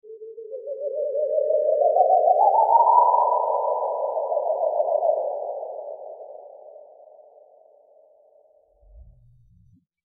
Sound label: Alien jungle